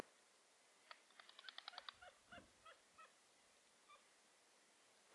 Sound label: A. flamm klask G. gall call